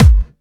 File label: Bassdrums Clubby; Kicks; vengeance essential club sounds-3